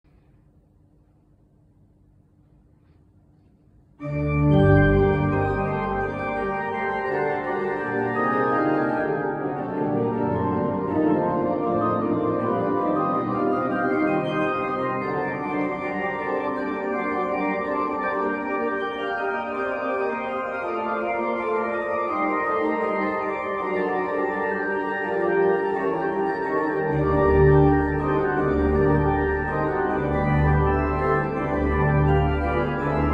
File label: pipe organs